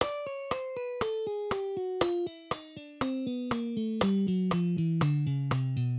Pull offs

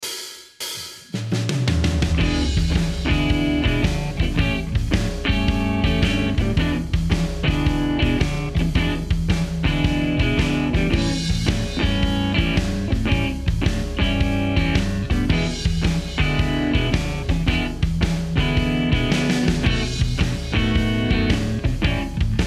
7th chords

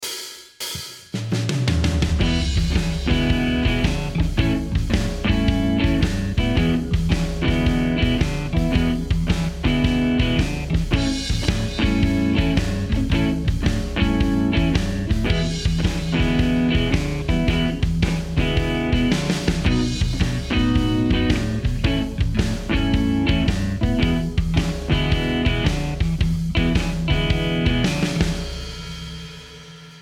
quick change 12 bar blues in A

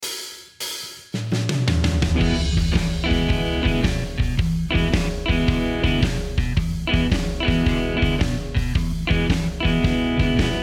basic 12 bar in A

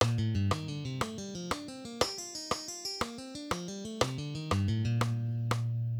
G Minor mixing things up, guitar

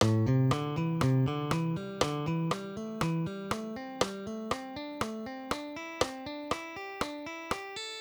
groups of four

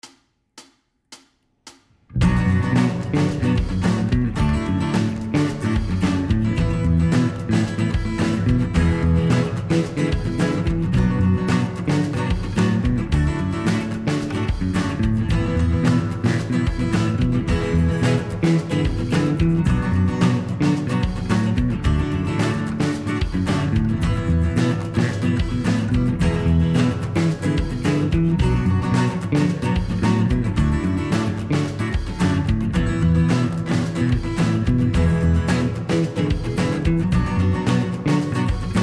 B Dorian Backing Track

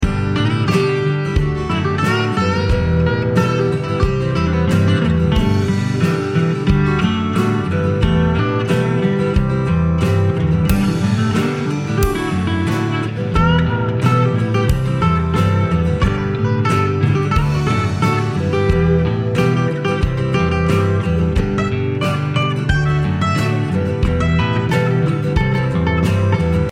E Pentatonic solo example

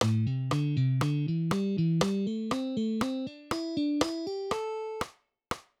Pull offs and hammer mixing

